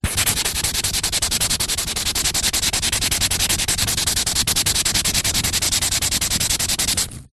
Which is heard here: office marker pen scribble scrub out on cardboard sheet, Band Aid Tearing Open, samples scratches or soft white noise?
office marker pen scribble scrub out on cardboard sheet